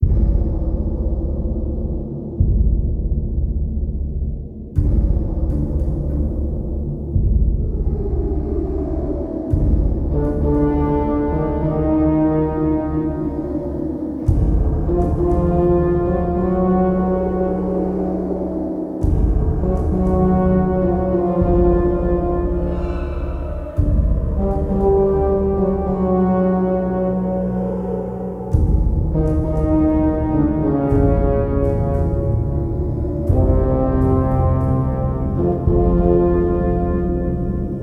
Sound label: dungeon; kult